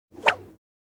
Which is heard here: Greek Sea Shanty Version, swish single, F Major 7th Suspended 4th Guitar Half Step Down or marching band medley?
swish single